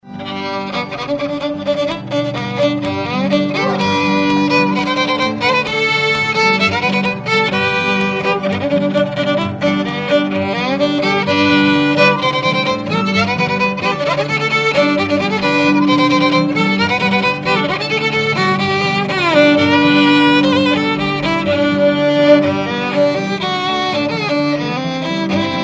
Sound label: Polka